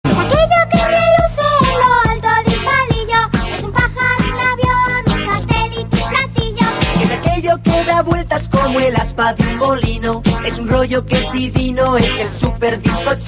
iphone super disco ringtone

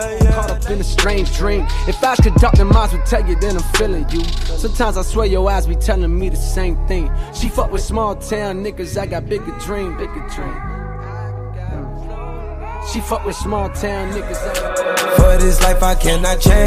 hip hop ringtone

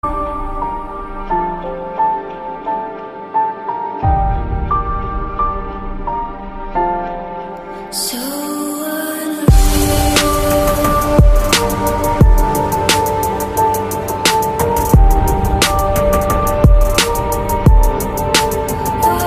instrumental ringtone

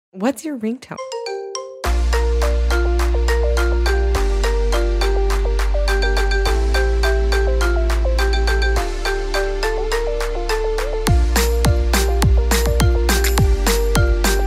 marimba remix ringtone; for iphone android

